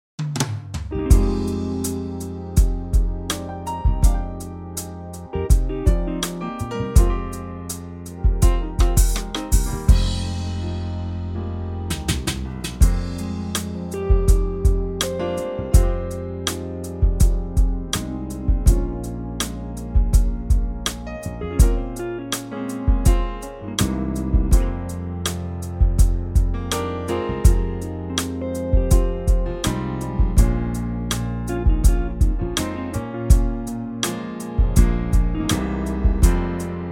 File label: Fm Smooth; key - Fm - vocal range - C to C; Superbly smooth quartet arrangement; soul classic